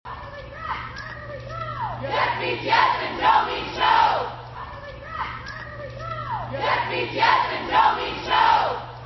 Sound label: chant